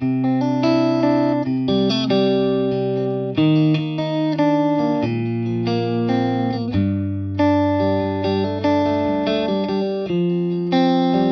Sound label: Strat Clean; egnater